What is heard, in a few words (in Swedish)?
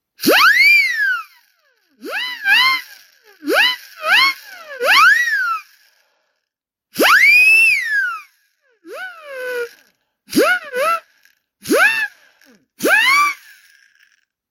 Visselpipa